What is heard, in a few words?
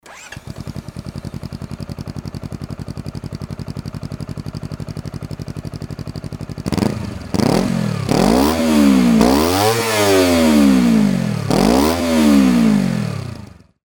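cbr250rr, motorcycle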